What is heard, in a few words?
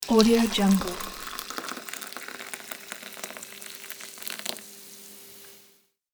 filling a tea cup